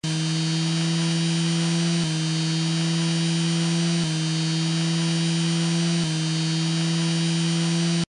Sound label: toy fan; Danger Mouse - Ultimate